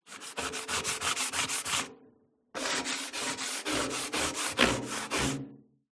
industrial metal drum cleaning scraping sanding grind